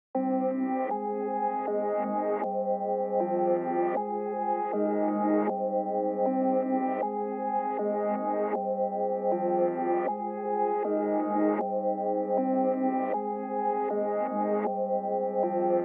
157 c minor

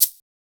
shaker one shot old school; Percs

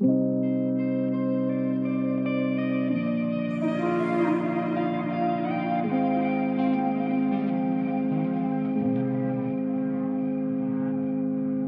BPM 164

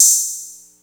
Open Hat

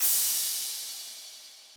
Crash; The Lunch77 Sound Design Mini Kit (Vol. 1); Cymbals

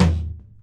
Tom

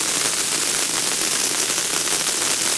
a cannon in air

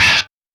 Chant Vox

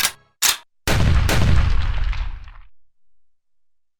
Gunshot

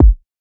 KICK

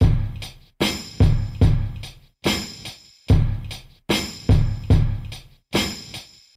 Loops, breaks

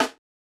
Open Snares

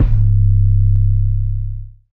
808 MUDDY

